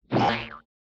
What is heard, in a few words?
boing